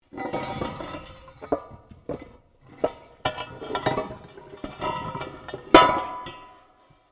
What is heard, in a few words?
METAL ON METAL LARGE MOVE; Metal (Sound Effects:Materials)